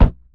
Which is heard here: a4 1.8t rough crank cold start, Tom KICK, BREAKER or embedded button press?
Tom KICK